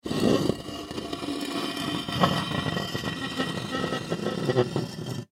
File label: Patio Furniture, Umbrella Stand Base Drag On Cement Scrape Long; Dragging; Household; Blastwave